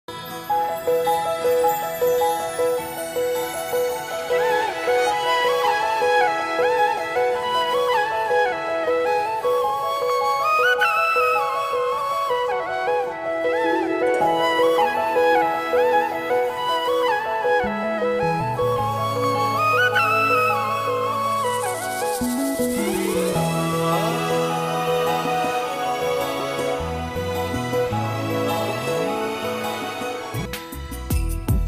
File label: drama ringtone flute bgm